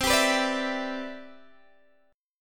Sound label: C Major 9th, Guitar DADGAD